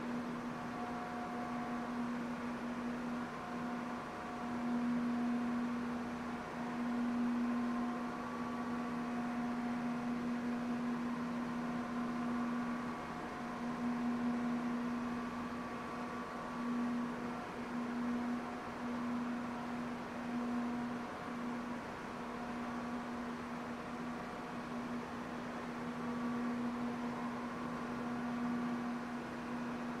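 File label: harmonic distant big sound of factory work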